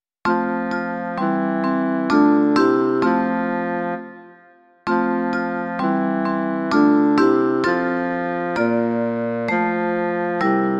vibrafon a fagot; Znám-li Pána Ježíše; Imaginativní verze

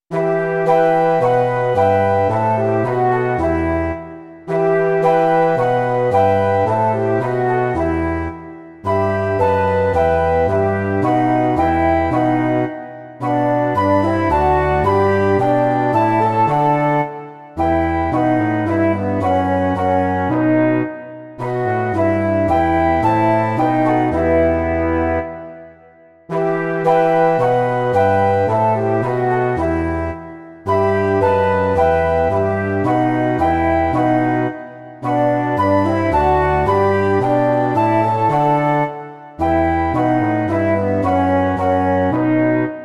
2 flétny, lesní roh a tuba; Beránku Boží, Kriste; Imaginativní verze

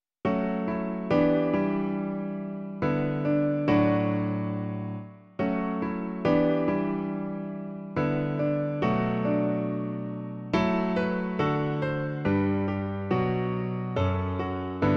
klavír, Klavírní verze

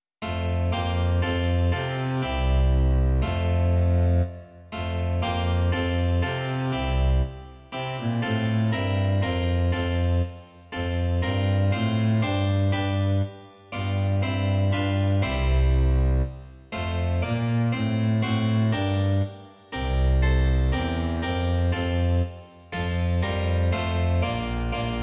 cembalo a kontrabas; Dej, Otče milý; Imaginativní verze

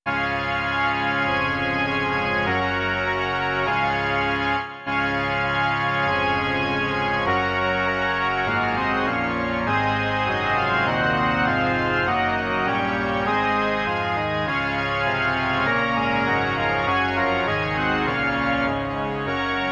varhany

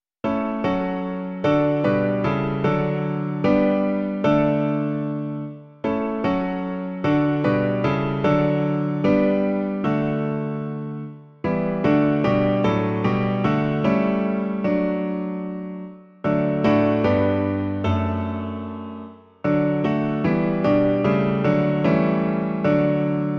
Pochválen buď svatý Bůh náš - klavír; Klavírní verze

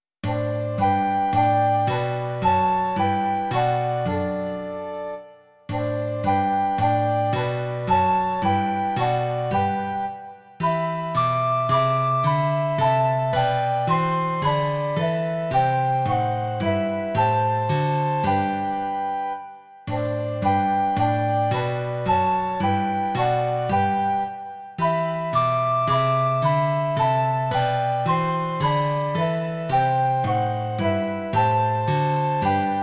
2 flétny a kytara; Hospodine hodný chvály; Imaginativní verze

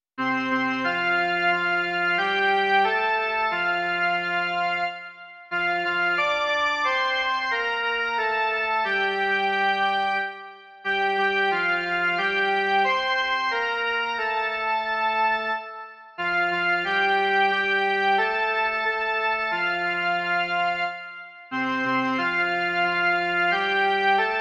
Tys, ó Pane, síla má jen melodie

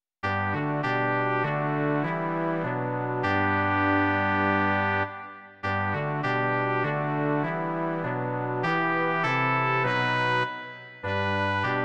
žesťový kvartet; Buď sláva Bohu na nebi; Imaginativní verze

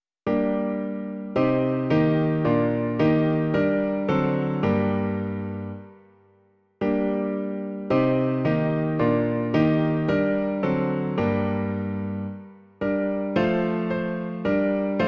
Přej, Bože, pokoje nám všem - klavír; Klavírní verze

